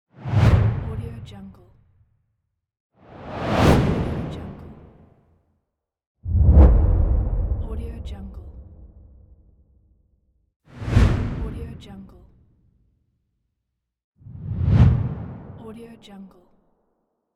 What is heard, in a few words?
wooshes